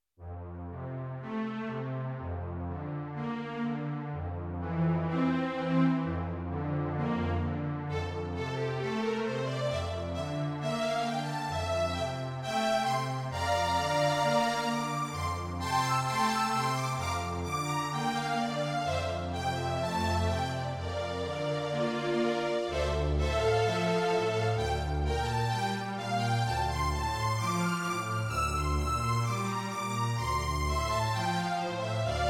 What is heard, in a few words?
Strings